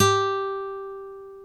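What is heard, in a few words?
G3 classical guitar; InVision Interactive; Lightware VOL-1; Instruments & Percussions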